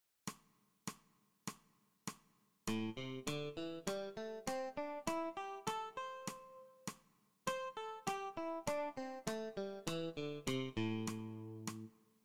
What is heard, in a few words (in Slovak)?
osminove noty tlmenie zapastim; cvicenie